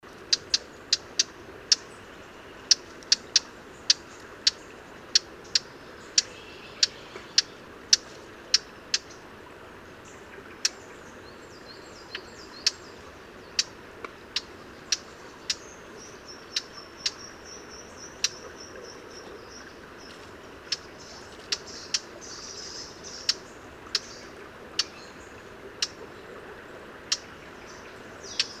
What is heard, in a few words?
Sylvia atricapilla; SETE